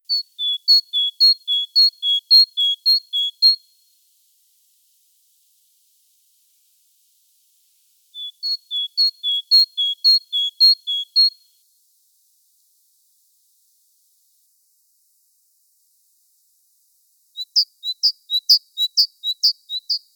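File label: great tit voice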